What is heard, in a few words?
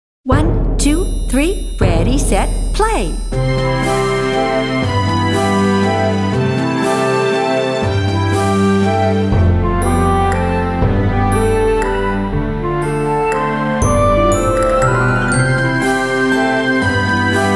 Waltz